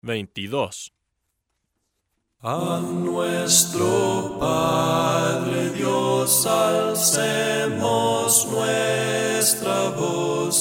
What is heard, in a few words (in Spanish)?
Palabras cantadas sin instrumentos; A nuestro Padre Dios